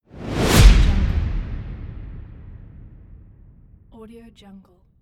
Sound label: the transition impact